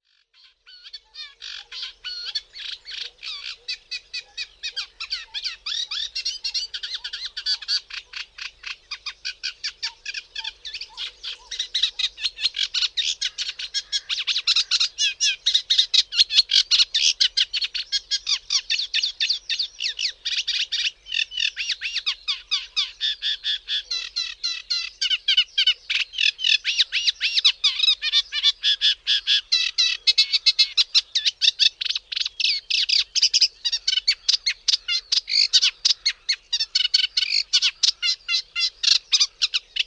TRZCINNICZEK, birdsounds

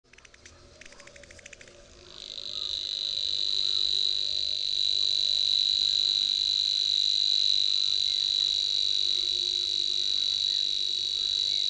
BRZECZKA, birdsounds

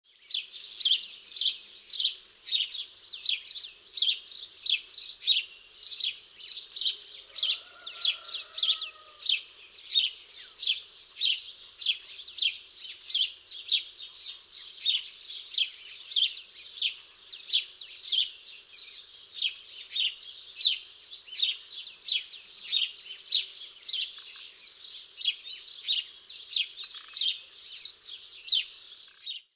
WROBEL, birdsounds

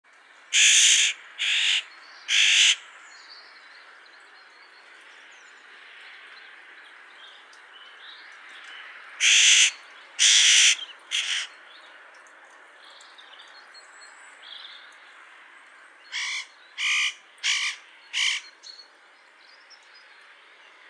ORZECHOWKA, birdsounds

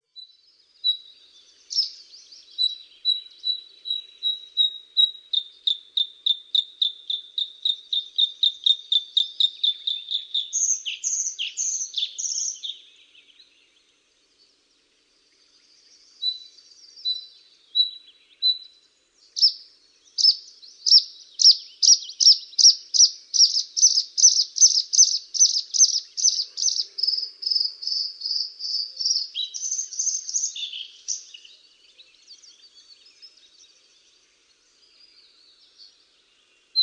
PODROZNICZEK, birdsounds

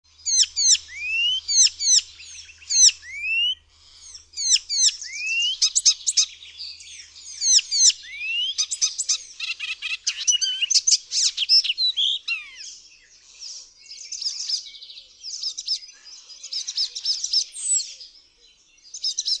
ZAGANIACZ

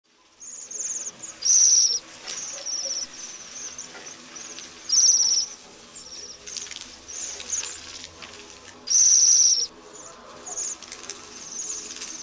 JEMIOLUSZKA, birdsounds

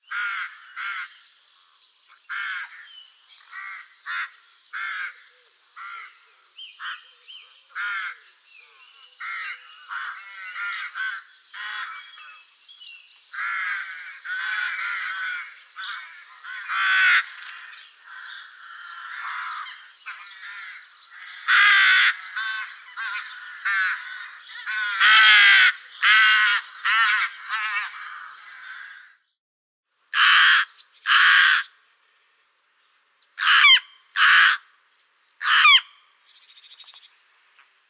GAWRON, birdsounds

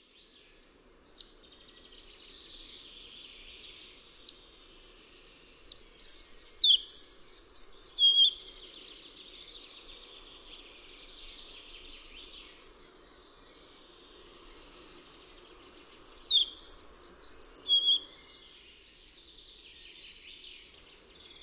mucholowka bialoszyja

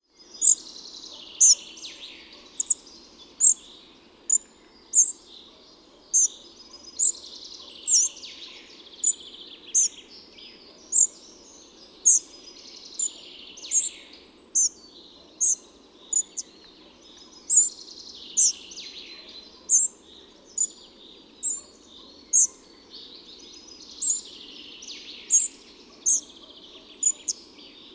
MUCHOLOWKA SZARA, birdsounds

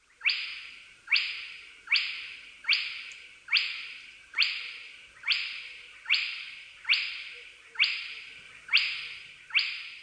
kropiatka, birdsounds

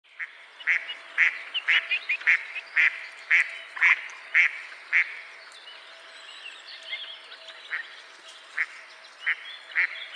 glowienka

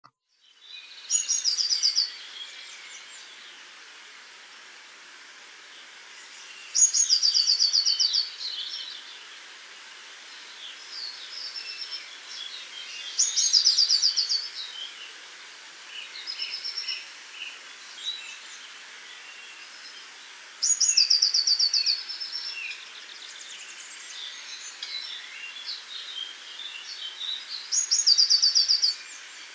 PLISZKA GORSKA, birdsounds

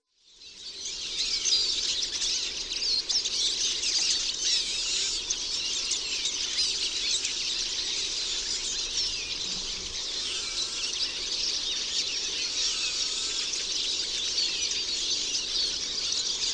CZYZ

